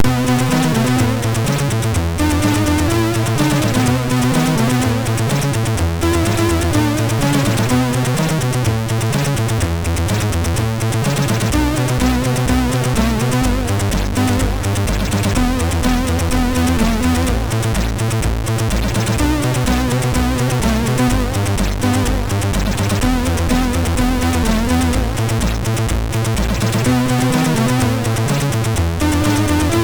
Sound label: sid MOS6581R3; D I S C The Game